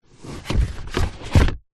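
foley cardboard box fast food empty flaps movements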